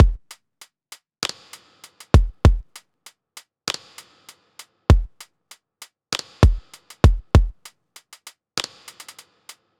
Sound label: Drum Loop 98 BPM, Hip-Hop; 99Sounds Music Loops; 99Sounds x Monosounds